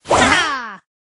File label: dash; bandit